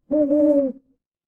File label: animal owl